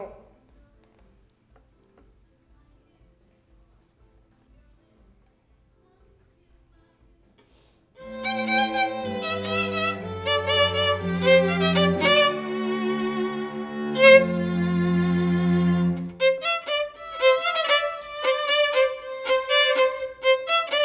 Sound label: violin